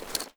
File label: m3 reload; weapons; svencoop